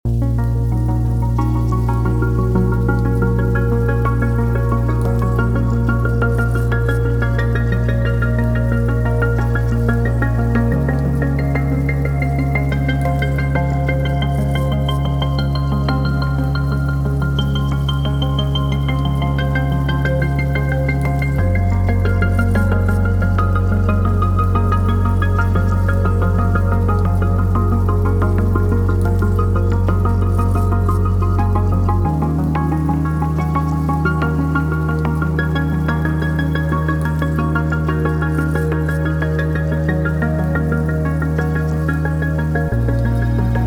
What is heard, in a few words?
loop